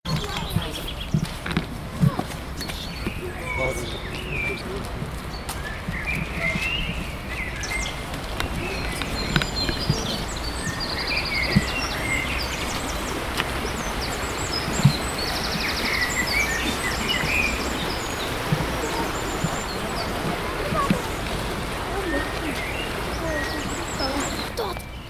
Zwitscher

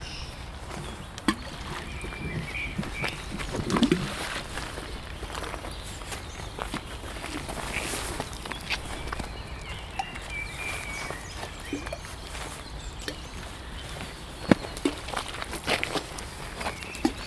Wasser

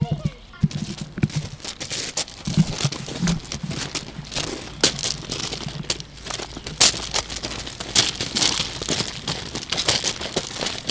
Kieselweg

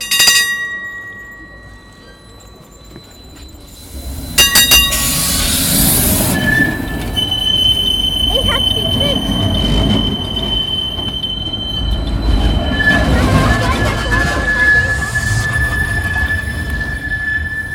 Trambahn

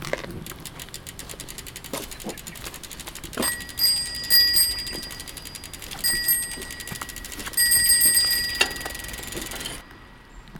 Fahrradgeräusche

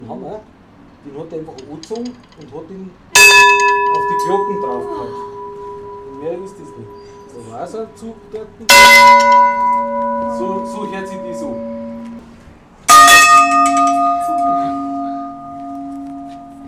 die 3 Glocken

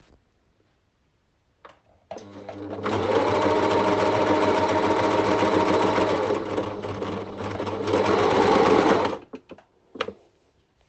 Nähmaschine

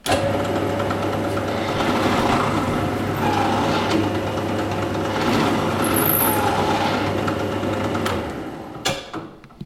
Bohrer

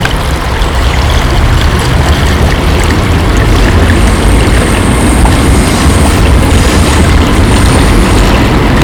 Geräusch

